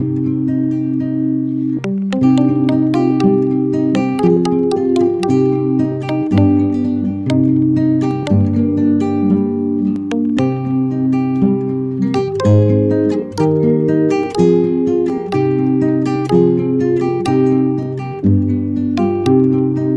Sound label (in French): extrait instrumental